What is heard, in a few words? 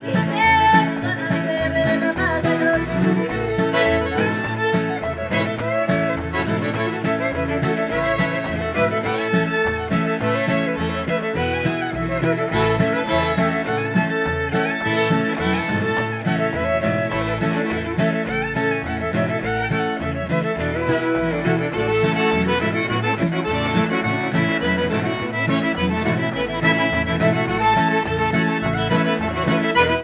musicbox